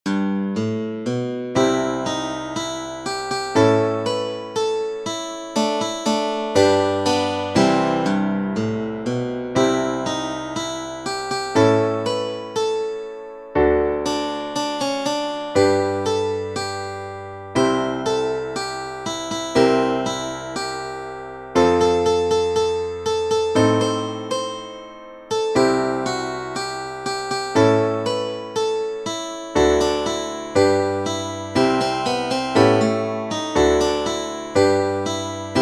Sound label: guitar